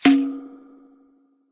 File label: Processed Ethnic Percussion